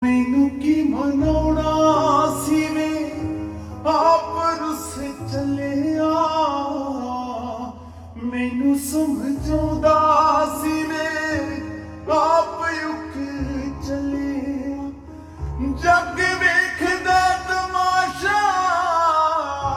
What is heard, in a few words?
Ringtone Slowed Reverb